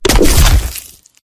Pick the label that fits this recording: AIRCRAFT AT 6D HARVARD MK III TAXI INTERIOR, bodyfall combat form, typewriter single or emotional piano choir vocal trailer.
bodyfall combat form